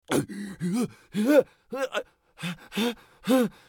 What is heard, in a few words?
bear death; eft